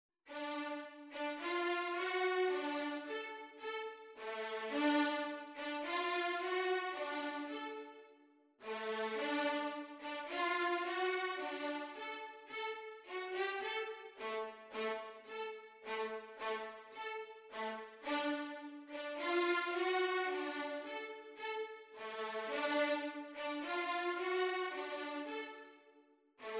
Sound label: Violins Duet